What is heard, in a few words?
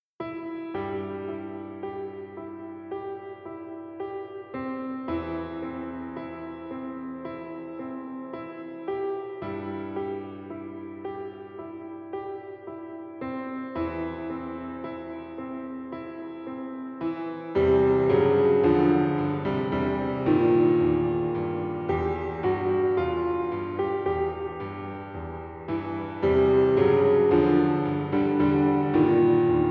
theme piano version